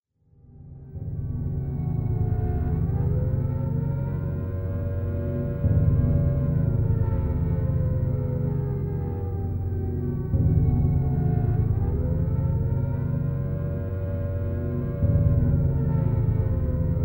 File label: Malfunctioning Clock; by koebi